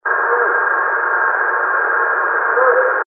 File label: Grunt; recorded during the 2004 September BFRO New Mexico Expedition